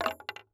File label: Weapon Bullet Shell 3030 Shell Cases Multiple Cases Fall On Boardwalk; AdobeCC